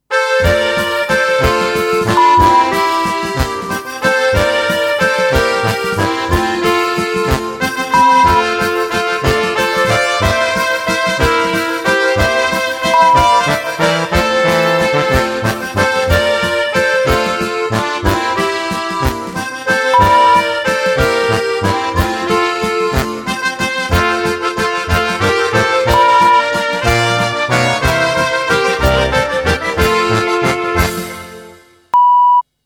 Oberkrainer Walzer; Volksmusik, alpenländische, bayerische Musik, Zillertal-Sound, Oberkrainer, Zither

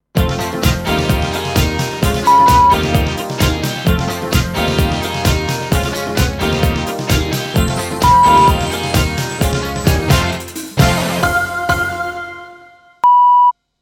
Sound Logo mit Sound Bett 2 Toene rockig; Soundlogos für Ihr Corporate Design

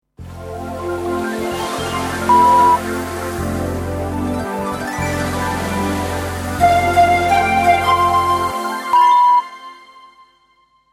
Sound Logo mit Sound Bett 5 Toene Dream

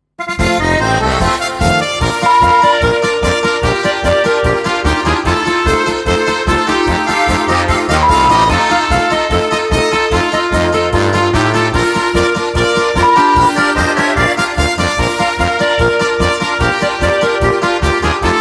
Oberkrainer Polka 148; Volksmusik, alpenländische, bayerische Musik, Zillertal-Sound, Oberkrainer; Zither

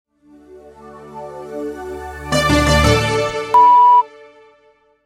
Sound Logo mit Sound Bett 4 Toene; Soundlogos für Ihr Corporate Design

